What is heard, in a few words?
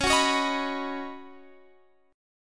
C sharp 7th Suspended 2nd Suspended 4th, C sharp, down; C#7sus2sus4; strummed